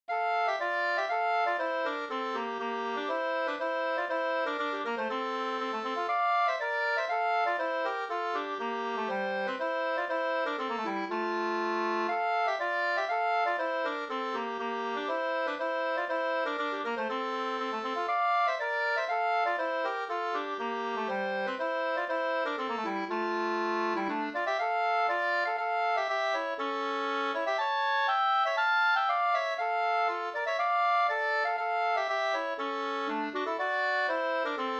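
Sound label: polska, two-part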